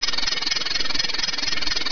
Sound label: SCAN